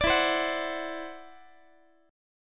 E 7th Suspended 2nd Sharp 5th E down Guitar 4A 4E 5A 5D 5G sharp 6C sharp; strummed; chord; JGuitar